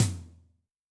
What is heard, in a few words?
RoomQL, Tom, Yamaha DTXpress-II